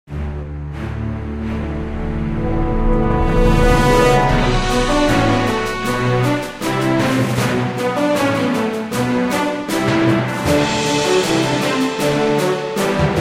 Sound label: Closing